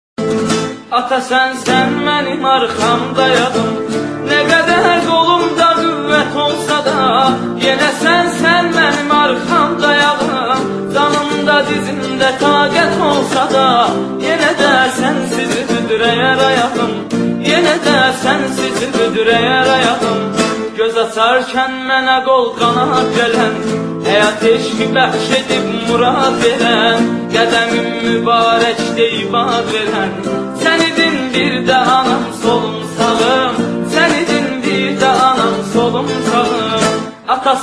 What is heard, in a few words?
guitar